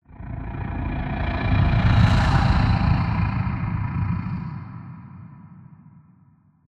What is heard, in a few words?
Roar Interior